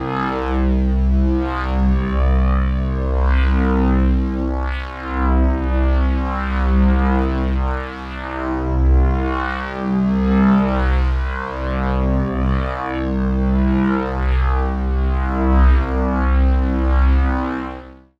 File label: Super Dronez, Underground UK House & Electro, Pads; Sonic Foundry (Sony Creative Software)